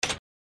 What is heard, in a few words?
backspace